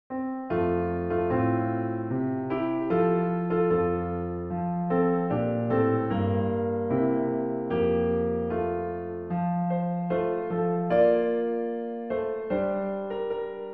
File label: O Christmas Tree; Classic